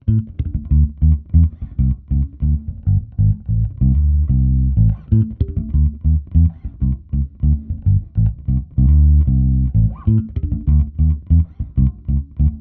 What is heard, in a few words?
EL BASS TUBE